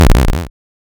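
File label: waveform FM sawtooth sine envelope silence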